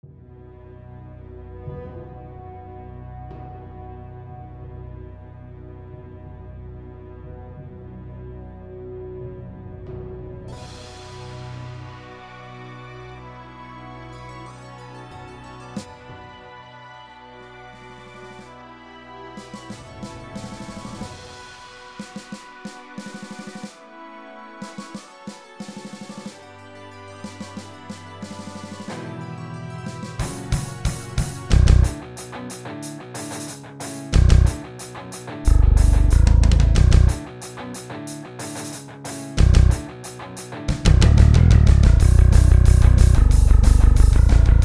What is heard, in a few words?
drums